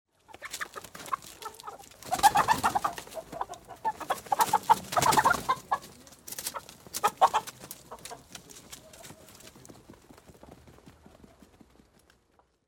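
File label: wings of a rooster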